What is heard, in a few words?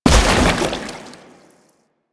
Step Water, Footsteps